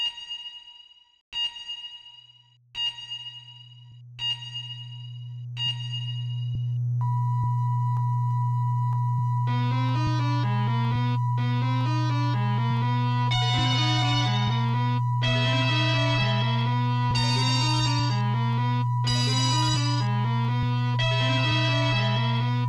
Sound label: Sine Wave